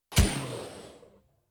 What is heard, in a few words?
whompy pneumatic blasts short bursts tubular